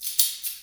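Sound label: finger cymbals; Spectrasonics; Heart of Africa 1; METAL FX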